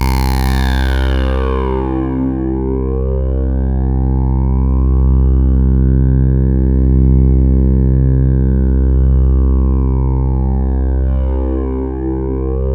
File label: C2, Roland JV 2080 TECHNO PAD